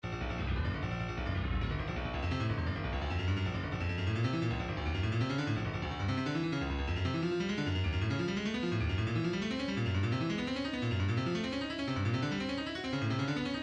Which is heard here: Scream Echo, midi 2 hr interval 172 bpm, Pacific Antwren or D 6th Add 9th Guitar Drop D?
midi 2 hr interval 172 bpm